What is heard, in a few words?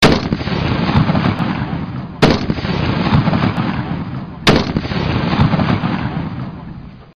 M107 50 Cal Sniper Rifle 3x